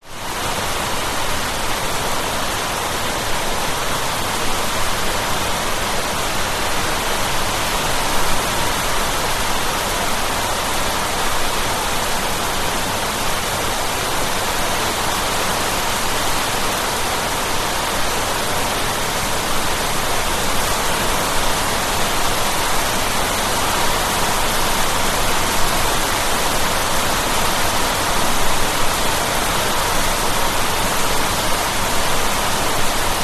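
rain heavy diffused noise and high tone drips on concrete bali indonesia; lukas tvrdon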